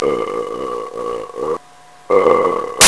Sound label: Burp